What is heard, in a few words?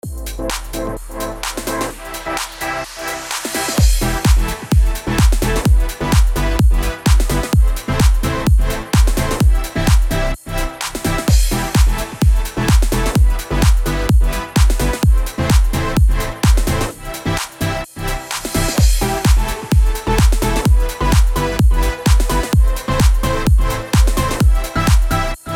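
house, E minor, 128 bpm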